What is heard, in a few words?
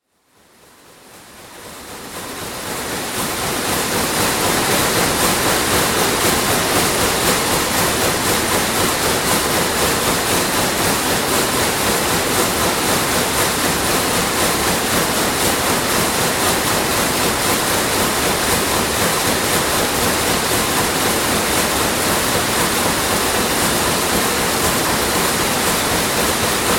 Sulzer weaving loom; Part of Machinery (Sound Effects:Machines); BBC